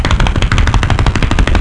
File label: Birds